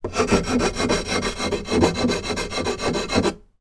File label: industrial tools file wood; sound effects one